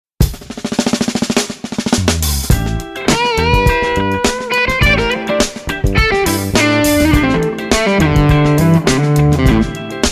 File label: C minor Bleed